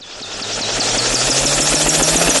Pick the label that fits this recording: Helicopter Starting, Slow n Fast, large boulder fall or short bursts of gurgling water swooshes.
Helicopter Starting